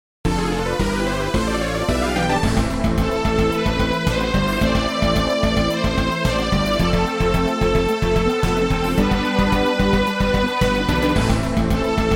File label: Battle; Super Skullgirls RPG; RPG Maker